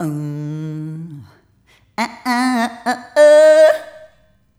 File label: Rhythmic C